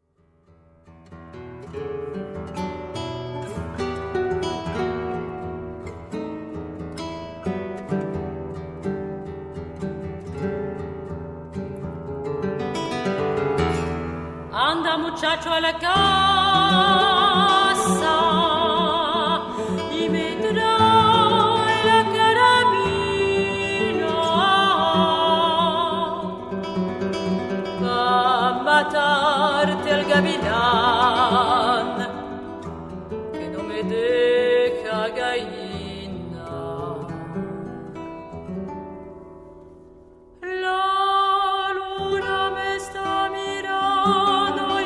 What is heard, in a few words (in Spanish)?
tonada